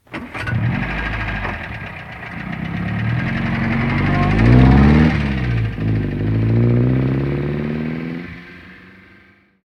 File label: Car Start Drive Away